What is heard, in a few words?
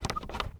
Bolt Lock; 99Sounds; The Warehouse